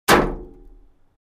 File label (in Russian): popadanie puli v metall